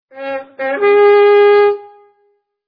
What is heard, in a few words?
fanfare